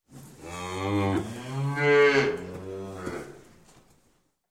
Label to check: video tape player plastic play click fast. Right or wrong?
Wrong